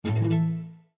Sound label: Hardware Insert; Windows